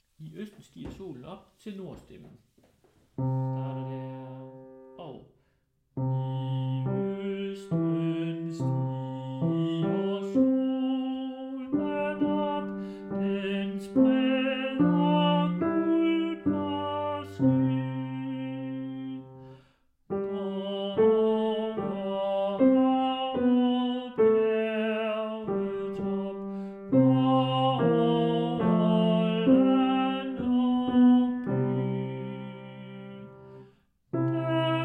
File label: tenor; I østen; harlev koret